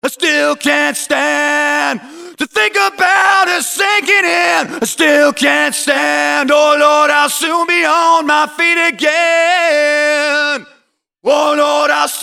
Vocal Chain With FX

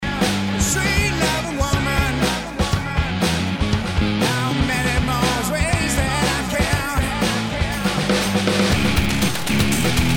verse chorus break example

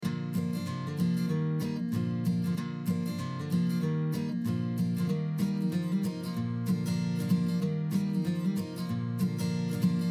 eq wide cut

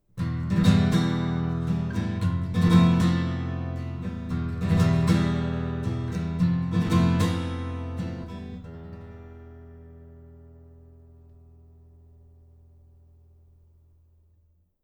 Apex 205 Mod Acoustic Hard, ribbon mic; Modded mic – Acoustic guitar strummed

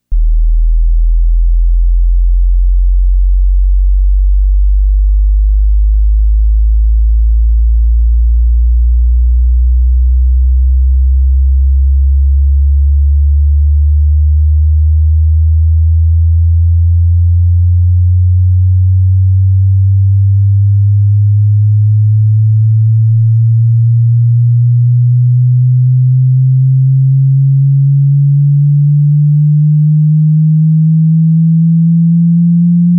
sine sweep 40 300

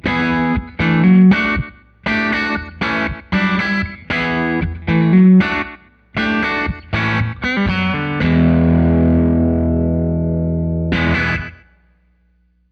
Apex 205 Mod ElecGuitar; Modded mic – Electric guitar; ribbon mic

